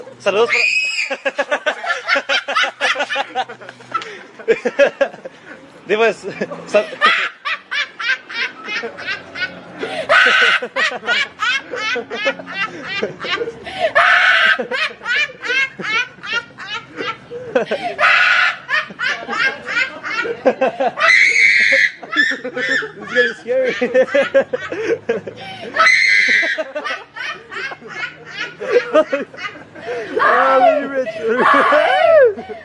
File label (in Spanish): risa locaa